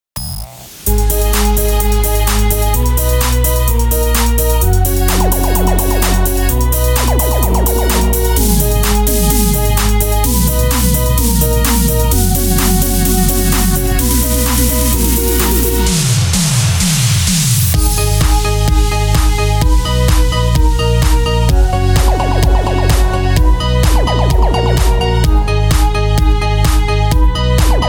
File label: club RETRO